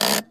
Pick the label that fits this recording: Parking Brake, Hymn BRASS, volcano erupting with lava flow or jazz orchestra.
Parking Brake